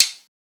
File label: perc slip, Drum One Shots; SD MIDNIGHT Sample Pack; Slate